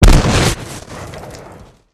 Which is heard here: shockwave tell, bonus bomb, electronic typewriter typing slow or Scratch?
bonus bomb